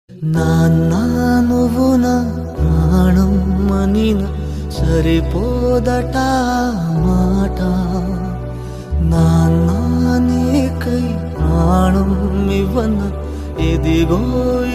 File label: animal ringtone